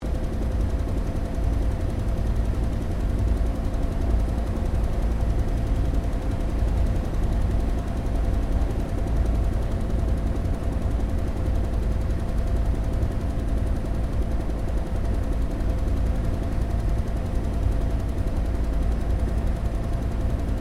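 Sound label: helicopter Bell UH1 Iroquois idle interior; RAN UH-1 Iroguios; Helicopters (Sound Effects:Vehicles)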